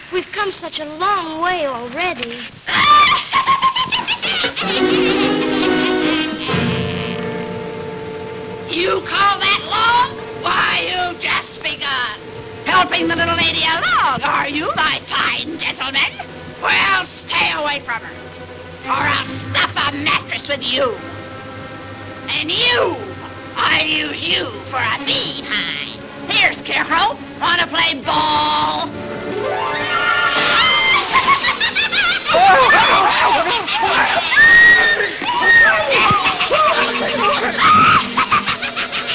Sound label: witch; wizard